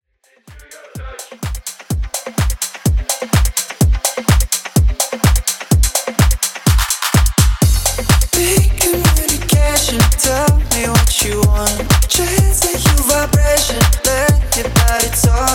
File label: Club Re Drum 126Bpm